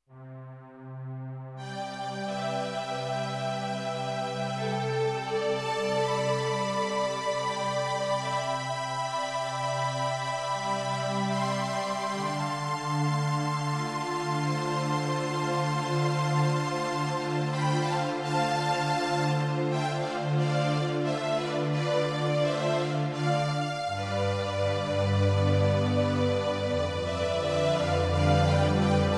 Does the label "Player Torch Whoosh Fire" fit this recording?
No